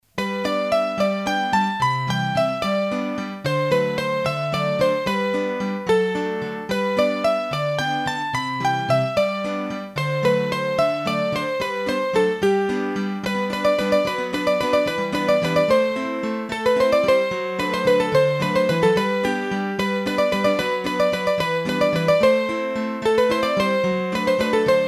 Valse, hurdy-gurdy; Valse a Bonnejoie; Massif Central

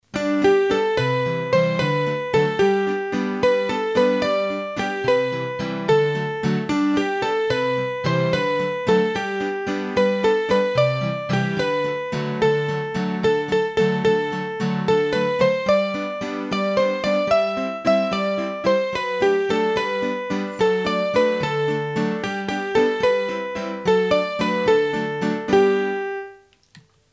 hurdy-gurdy, Valses; Sur les Bords de la Riviere; Massif Central

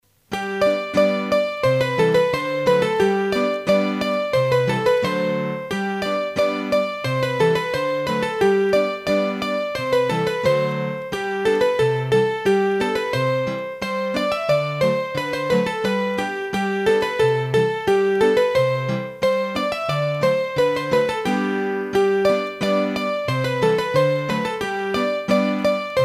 Rondeau de Landes, hurdy-gurdy

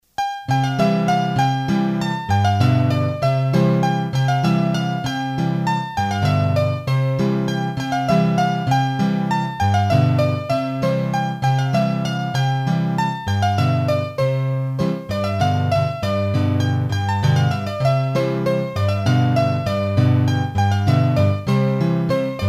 La Marianno, Bourees d'Auvergne, hurdy-gurdy

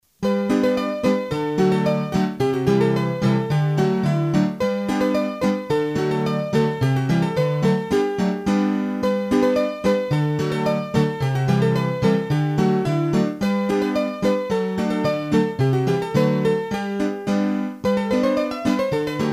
Polka, hurdy gurdy; Polka Lypse

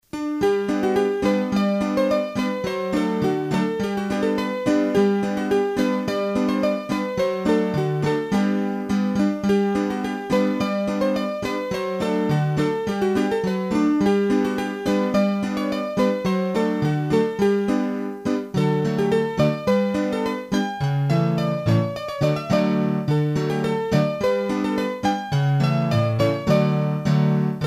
Polka, hurdy gurdy

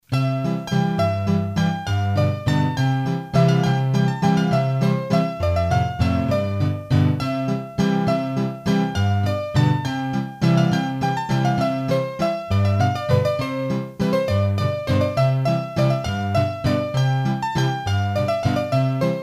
trad, hurdy-gurdy, Valses; La Francounettee

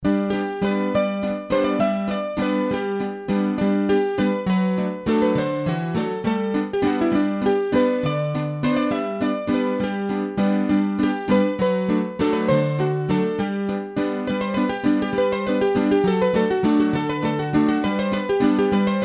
Valse, hurdy-gurdy; Valse a Guilleman; trad

